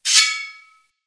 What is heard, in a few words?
raid warning